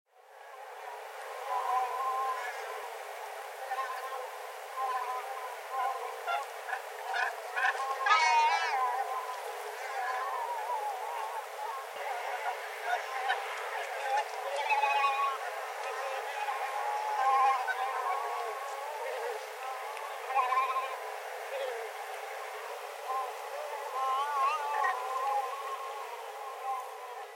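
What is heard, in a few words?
himeu, Pelagic Cormorant; sarufutsu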